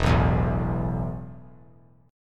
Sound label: E Suspended 4th E, down, 3C,3F,3A,3D; Esus4 strummed